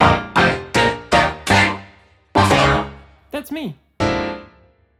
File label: Video Intro, Jingles